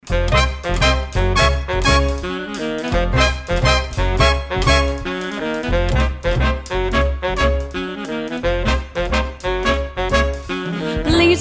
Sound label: Jazz Band; Seattle